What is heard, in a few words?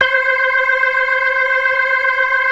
ORGAN, 4 DRAWBARS; Keith Emerson Signature Series